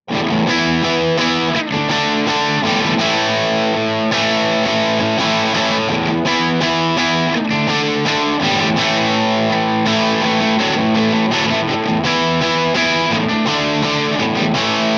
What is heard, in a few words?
crunch; jensentone